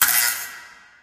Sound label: light flicker; Source Engine; Portal 2